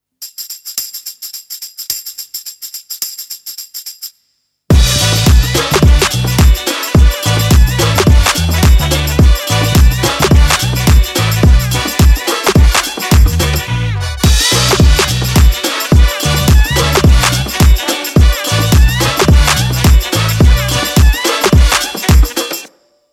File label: FUNK